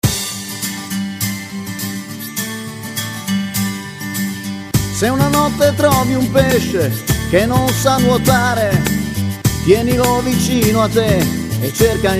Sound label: Viola